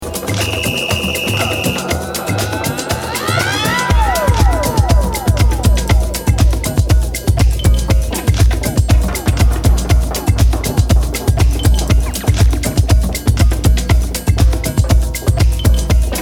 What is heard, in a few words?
DJ Drum Tool